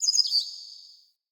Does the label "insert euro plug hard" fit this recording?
No